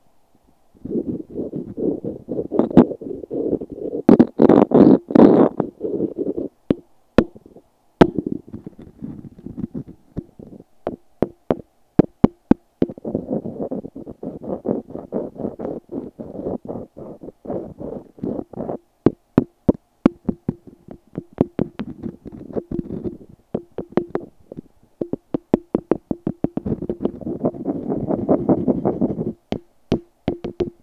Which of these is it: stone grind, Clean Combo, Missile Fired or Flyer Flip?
stone grind